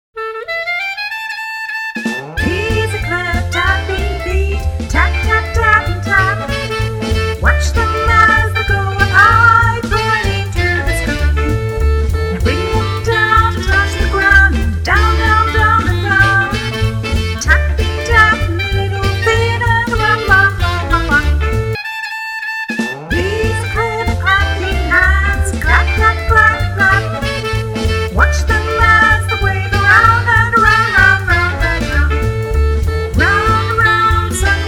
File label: Tap and Clap Jive